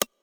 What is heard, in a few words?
mouseclick; gambling; DoubleTigersWD; wazdan; ultrafast